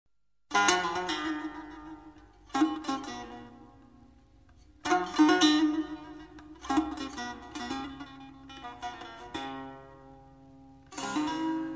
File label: Bass Tanbour Solo